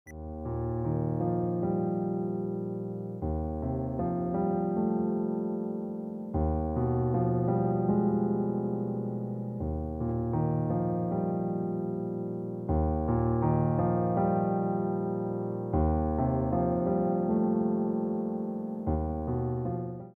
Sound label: PIANO